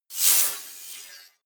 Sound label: sci-fi telemetry; zaps-buzzes